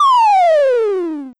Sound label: fall; PinmikPanik